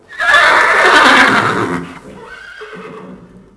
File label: stables; WhiteOut; XBOX; trojan